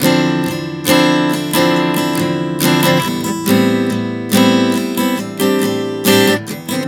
Progression 070 Dm9-Am9, Acoustic Guitar, Chord Progressions 070 bpm; Sonic Foundry; Songwriter Acoustic Guitar Companion